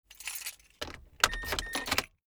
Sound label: key ignition insert; transportation